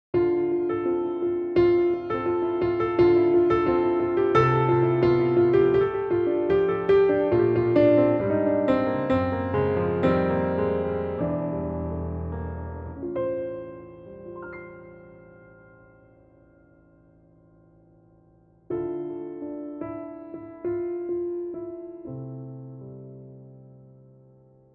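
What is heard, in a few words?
piano track; Pianotrak; key - Dm; vocal range - (C) F to A; Very lovely piano only arrangement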